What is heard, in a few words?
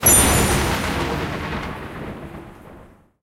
crystal; parthenon